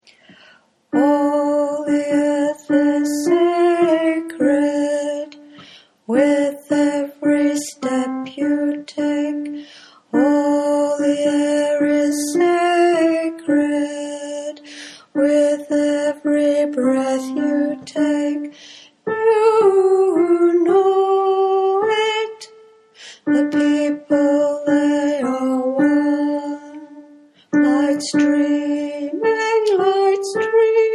Native American chants

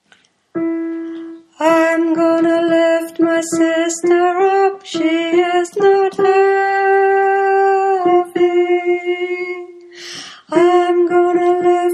I'm Gonna Lift soprano, choir

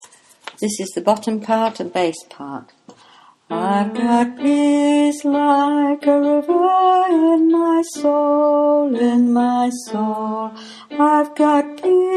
Peace Like a River bass, choir

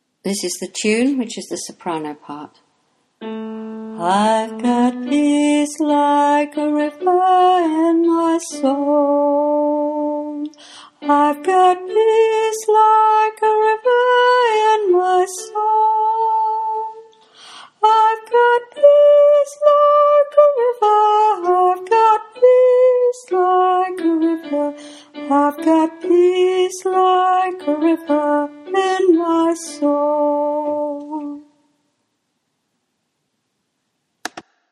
Peace Like a River soprano, choir

